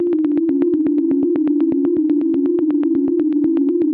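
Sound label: 122 BPM Arps A major G minor Tonic F; arp essentials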